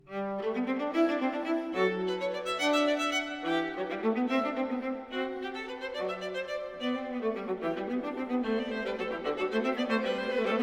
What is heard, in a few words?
Violin, Viola & Cello; Bach - Goldberg Variations - Trio Zimmermann; Arr. Trio Zimmermann for Violin, Viola & Cello